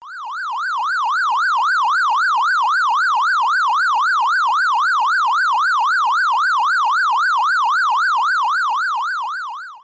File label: ghost spurt move; pacman